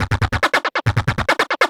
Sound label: Industrial 140